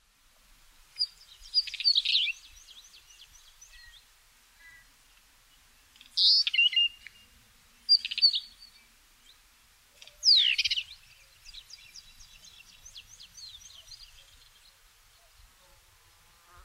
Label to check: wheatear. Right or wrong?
Right